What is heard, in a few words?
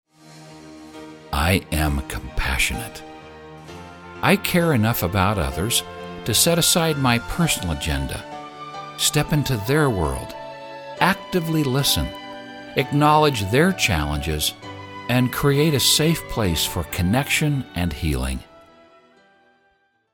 music; Compassionate